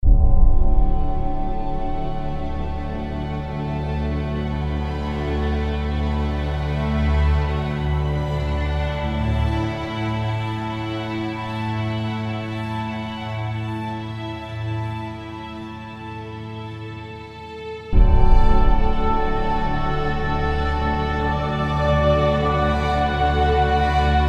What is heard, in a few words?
underwater; Poptropica